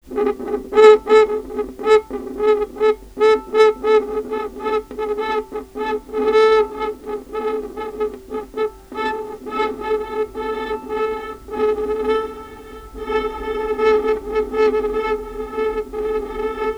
Violin Extended Technique

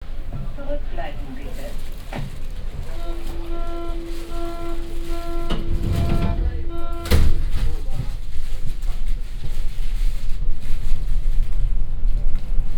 U Bahn Berlin; Sound Scapes

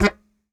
Very Short Note, Melodica

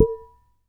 Damped Hit, Glass, Large Wine Glass

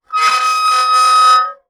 Soldering Iron Stand Drag

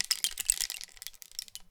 Rain Stick, Percussion Samples

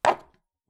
Palette, WoodenPalette

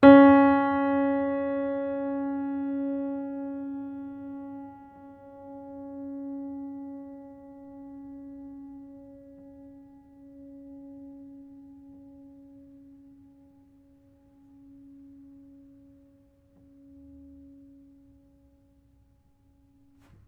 Note, Piano; Piano Miscellaneous

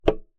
Key Noise, Melodica

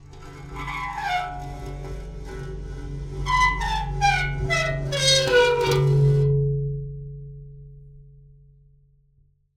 Pane Of Glass Rub

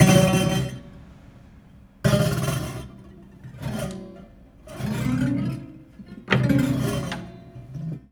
Marble Bounce Roll, Piano

